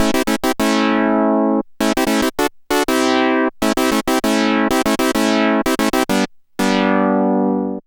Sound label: ABBADONS DRY, Synth and Organ; InVision Interactive; Keith Emerson